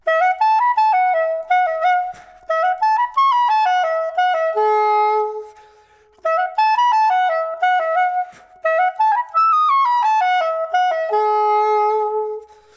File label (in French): Le saxophone